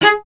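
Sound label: strings violoncello G#; Miroslav Vitous; Solo Instruments VOL-1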